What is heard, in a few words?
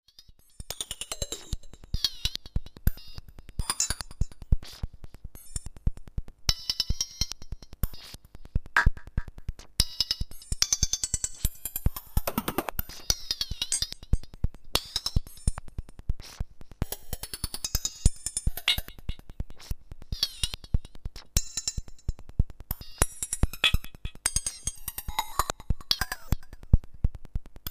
Instant Glitch, RS 370 Polyphonic harmonic generator; All sounds come from the RS370